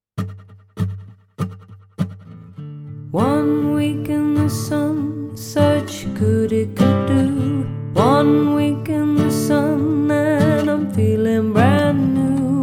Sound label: (Acoustic)